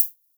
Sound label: Cymbals HH Open; Vengeance Samples